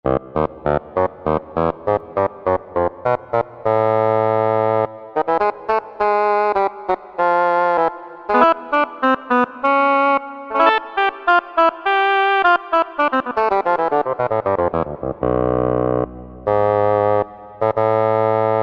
Double Reed, Korg M1; Factory preset examples

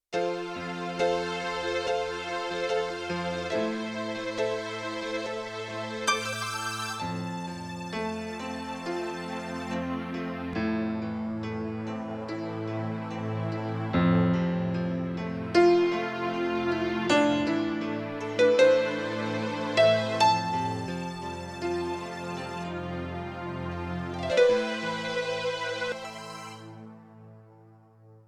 Piano-Strings; General Performance

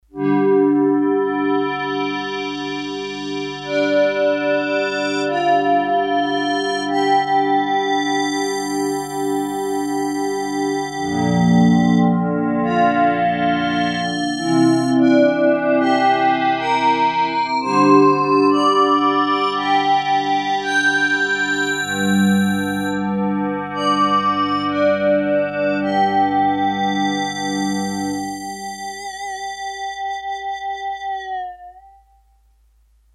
Synth sweep, Korg DS-8; Factory Patches

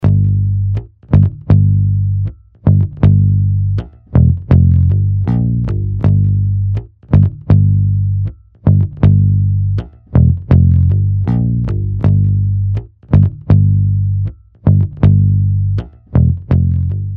Rock Bass; Apple GarageBand 2; Apple Loops

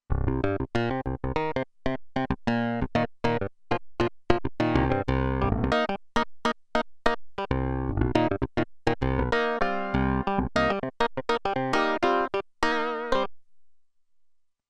Clavi, Roland Alpha Juno-2; Original Sound Chart patches

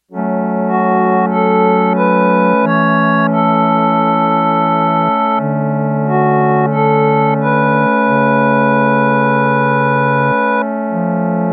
Harmonium; Yamaha TX802; Factory Patches; Voices

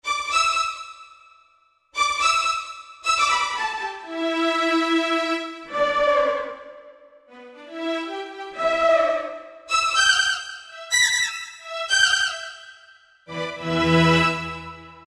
Disco Velo Bow; House; Roland SR-JV80-19 House Collection

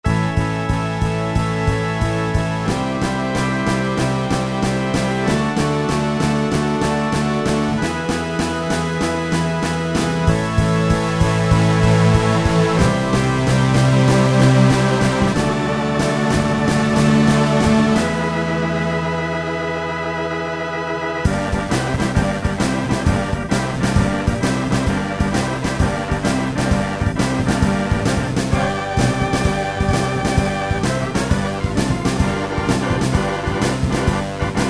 Rock Quartet, Kurzweil K2000; Kurzweil K2000 V3

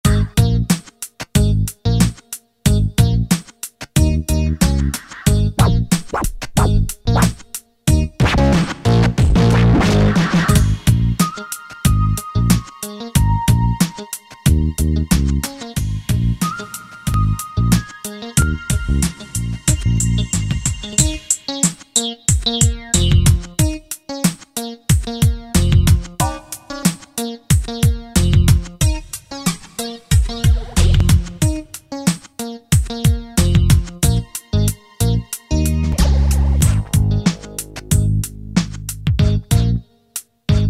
Gangsta; Yamaha DJX-IIB

